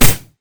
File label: blade surge; LoL; irelia